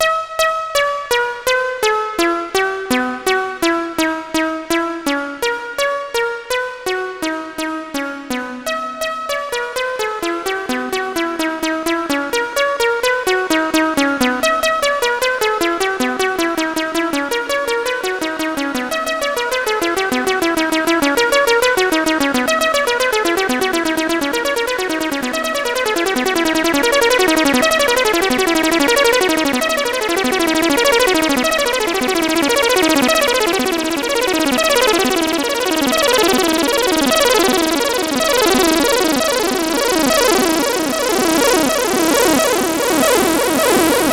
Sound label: 3RD SEQ, Synth and Organ; InVision Interactive; Keith Emerson